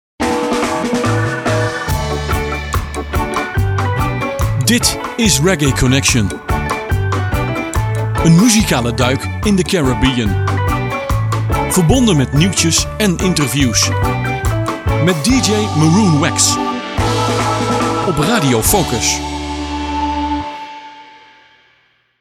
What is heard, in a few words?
Jingle Reggae